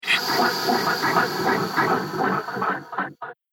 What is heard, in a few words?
Dark Merciless Movement; scary soundscape; horror